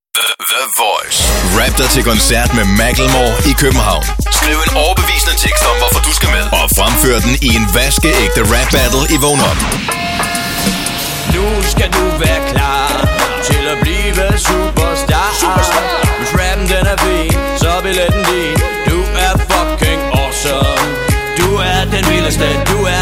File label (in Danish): rap dig til koncert